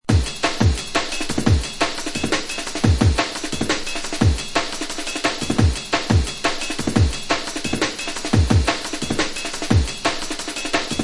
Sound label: Daddy Raymen Hardcore, beats; ray keith dread jungle breaks vol 1 60 beats for dubplate clashes battle junkies